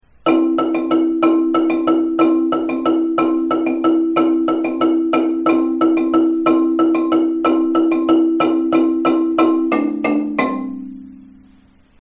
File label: marimba, transition